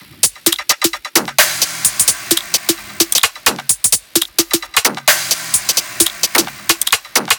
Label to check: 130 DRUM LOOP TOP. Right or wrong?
Right